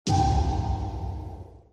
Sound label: parried